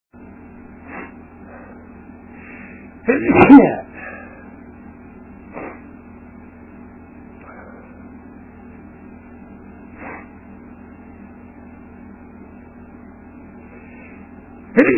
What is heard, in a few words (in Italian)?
Sternuto, male